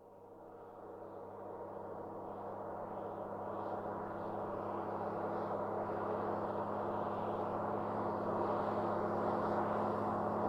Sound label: Truck, Army Carrier, Pass By; gun and weapon sounds; captain audio; fully loaded